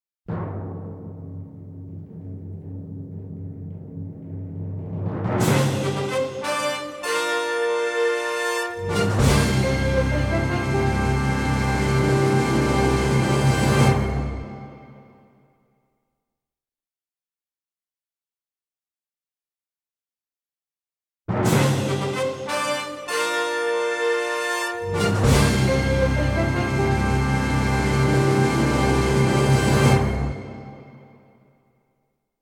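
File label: Main Fanfare - Instrumental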